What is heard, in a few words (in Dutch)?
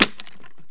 klik